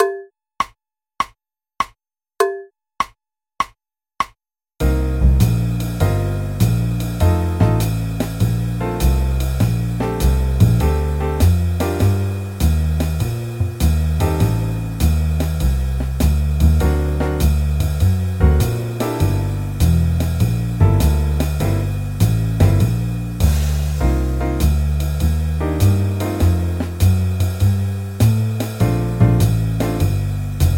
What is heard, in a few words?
100 BPM